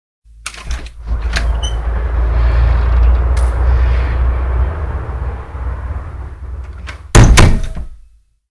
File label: Door Open-Close; 100 sound effects